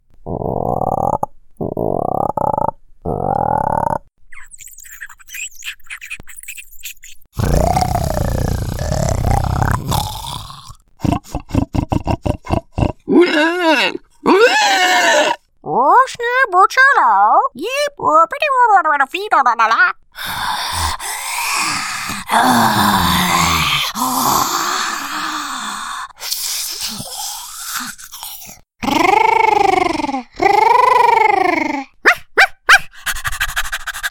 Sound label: US English Creature Sounds